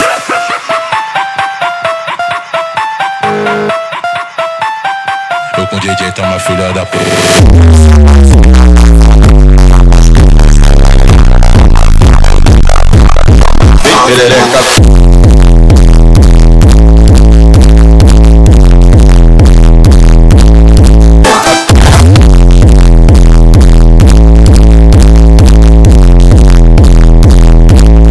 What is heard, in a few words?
Hardstyle Remix Superbass